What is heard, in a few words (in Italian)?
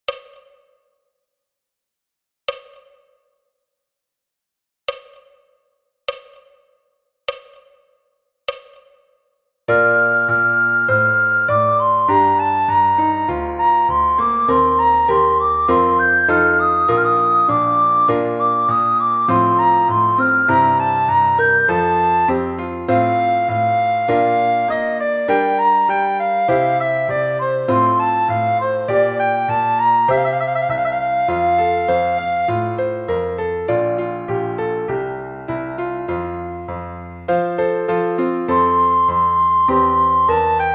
largo cantabile sopraan; Flierefluiter 7